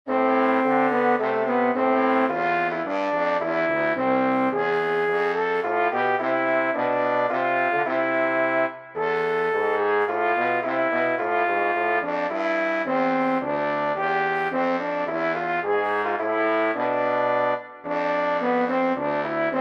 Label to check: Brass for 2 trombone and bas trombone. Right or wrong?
Right